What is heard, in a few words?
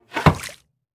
environment bamboo fountain hit